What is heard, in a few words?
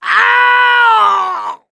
death scream, mechanic, survivor; voice